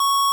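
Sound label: pico C#5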